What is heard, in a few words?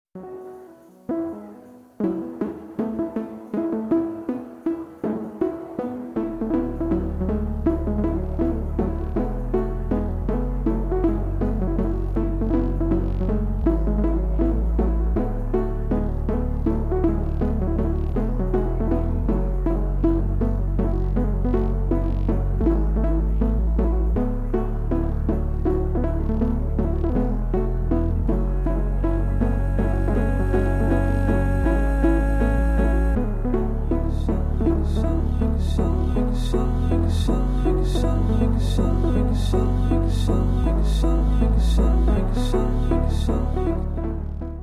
Instrumental Version